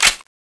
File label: clip in; tfa cso; gilboa viper